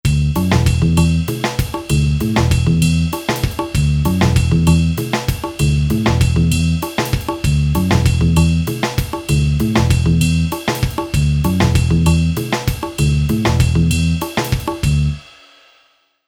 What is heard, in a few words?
Ska